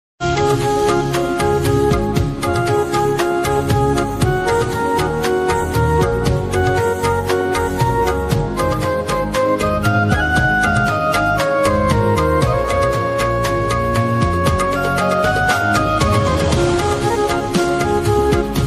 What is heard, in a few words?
flute ringtone, hindi ringtone, love ringtone